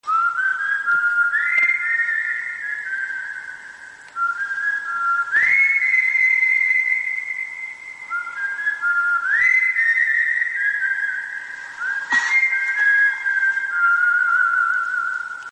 sweet whistle bollywood instrumental song ringtone